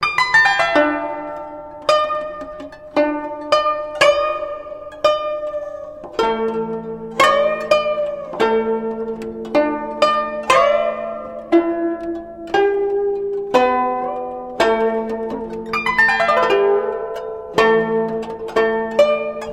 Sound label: Music; guo ling ding yang